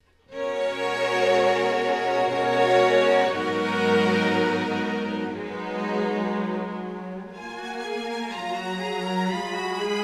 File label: strings